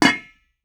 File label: Pot Lid, Kitchen